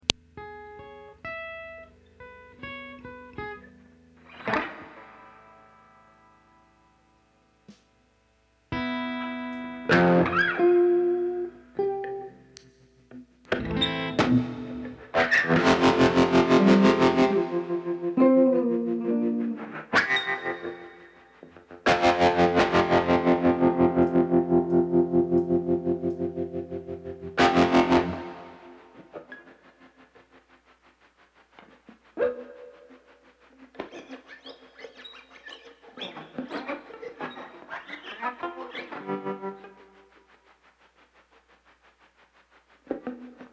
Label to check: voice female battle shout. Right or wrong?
Wrong